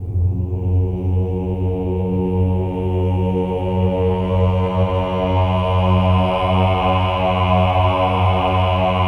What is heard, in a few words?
OH-AH F#2, choir; Spectrasonics; Symphony of Voices